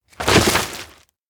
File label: note trash; anemoi